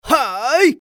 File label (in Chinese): 用力; 搞笑